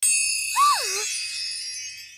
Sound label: rescue queen complete; run-dorothy